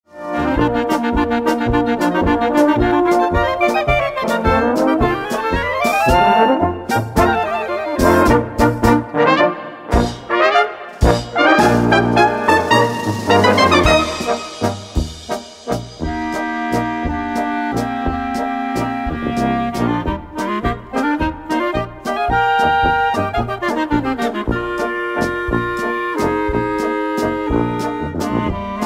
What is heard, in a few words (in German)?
Almdudlerpolka, Blaskapelle